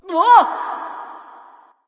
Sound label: grunt hit light; smite; voicepack; hel